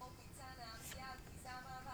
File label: jingle radio